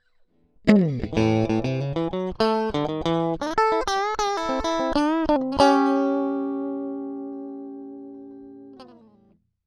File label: Crunch Dry